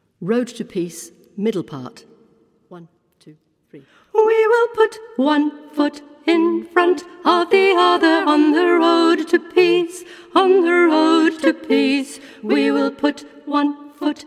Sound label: SAB alto